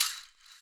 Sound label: SHAKKA, Ethno Techno; ILIO - Ethno Techno